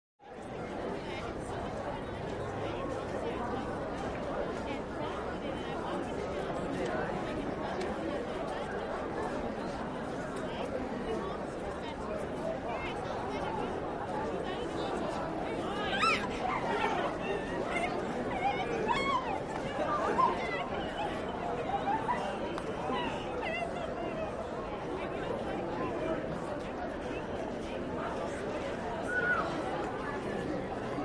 School Between Class, Between Classes

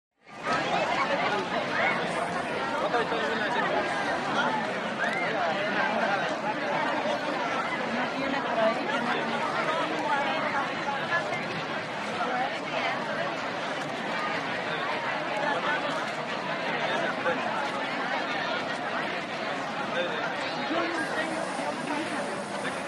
Open Air Market Dist, spanish

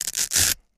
velcro tear

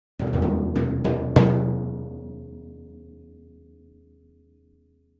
Timpani, (Hands), Accent, Symphonic Finale Hits

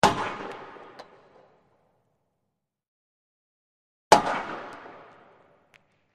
Hockey Puck Hit Board

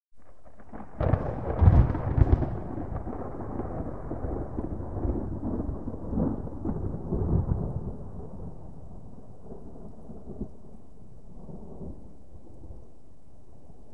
Thunder; Rippling Thunder By

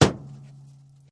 Hammer Hit Auto Body; hammers

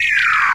raygun; ray guns

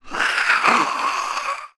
monster hiss

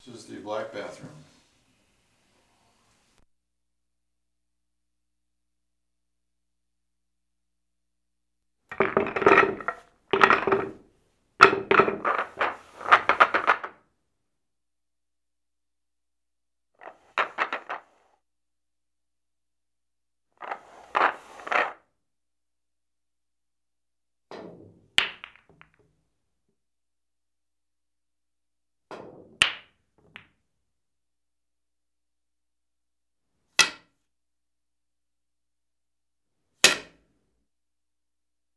Pool Balls Movement

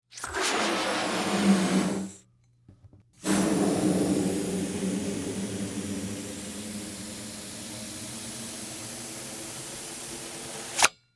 Medium Rubber Balloon; balloons

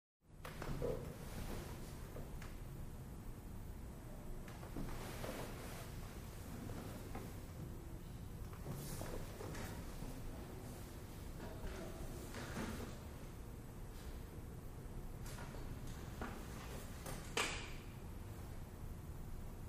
School Classroom Bsy

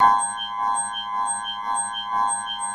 Video Game Transiti

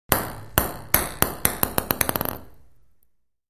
Ping Pong Ball Drop

